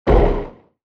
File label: Fantasy rpg game menu ui error